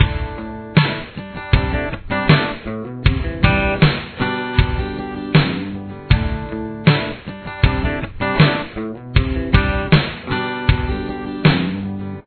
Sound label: full chorus, guitar